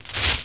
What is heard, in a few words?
rake